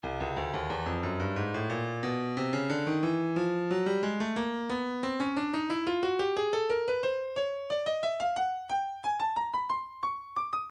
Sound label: breakbeat 090 bpm; Stylus